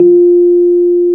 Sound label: PNO, Electrics; Club-50 - Foundations Roland; FRhodes2